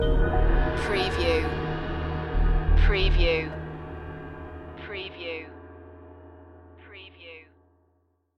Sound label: stinger